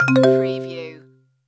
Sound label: Slot Machine Musical Ditty; Casino / Slot Machine Sounds